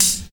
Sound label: old school soft hip hop pedal hi hat one shot g key